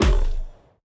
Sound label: Boss Core Hurt Base; Avie; Going Under